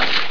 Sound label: BRUSH; Screen Wreckers